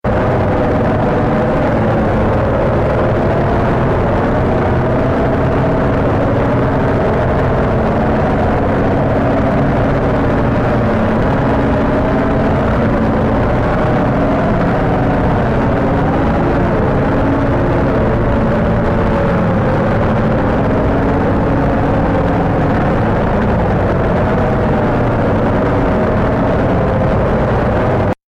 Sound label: loop